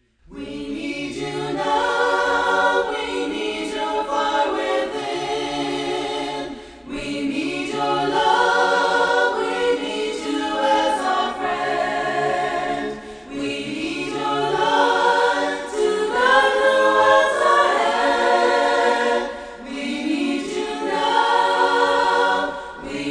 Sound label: The Spirit's Fire voices only